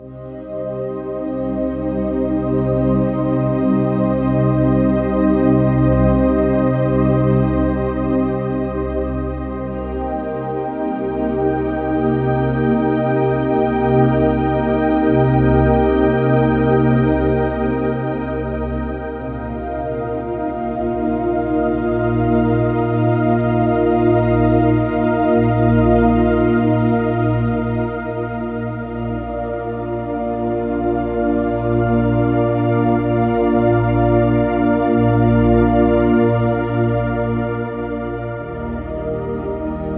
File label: Meditative; soundscape